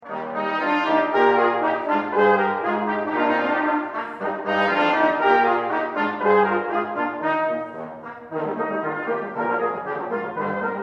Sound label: brass trio; mozart